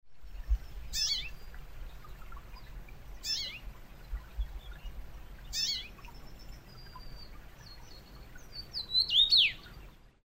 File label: common rosefinch; teremiski